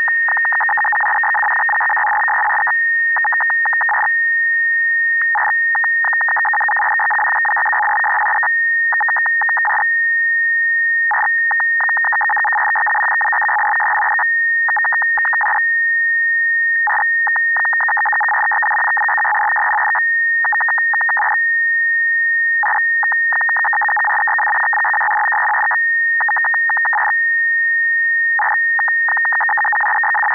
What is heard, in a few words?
7139.38 khz lsb